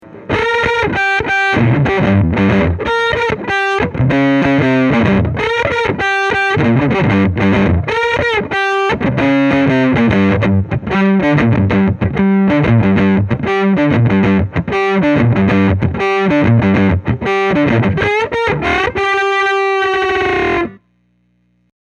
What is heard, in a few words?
buzz bollywood